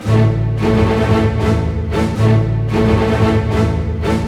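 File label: 112 war, orchestra; East West; Scoring Tools; War Status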